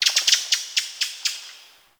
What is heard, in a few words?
Bird Alerted Thrush Call Far; Midrath Island; Wildlife